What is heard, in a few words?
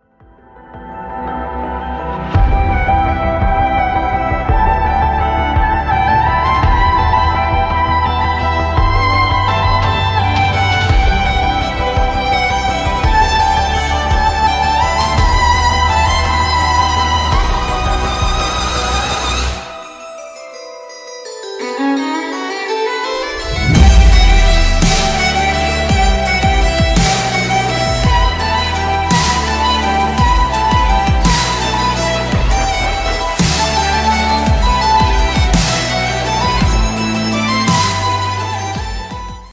carol of bells ringtone bgm